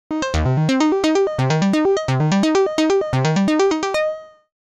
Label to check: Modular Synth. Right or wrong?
Right